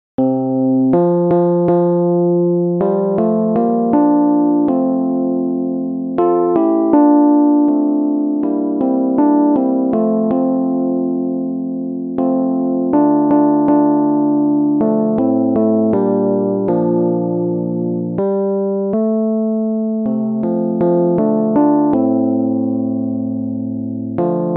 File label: shenandoah Lead